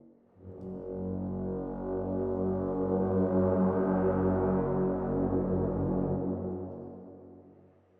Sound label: Dramatic Brass